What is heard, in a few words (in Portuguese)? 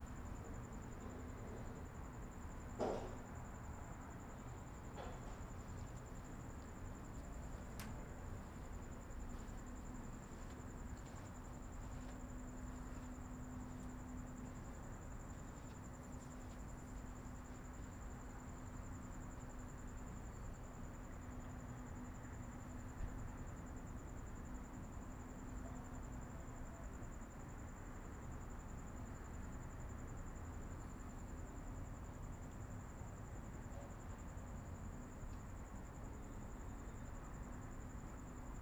Ambiencia noroeste rua a noite com ar condicionado, grilos, transito longe